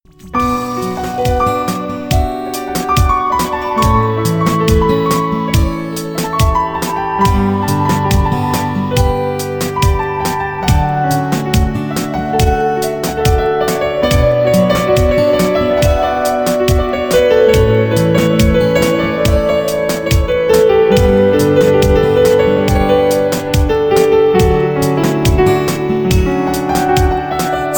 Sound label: Romantico Piano